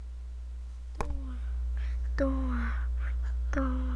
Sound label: door